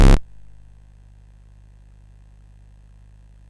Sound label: Dragonworld sid CSG8580R5